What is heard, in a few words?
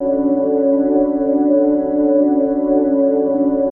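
Pad Synth; Equipped Music; Loungin House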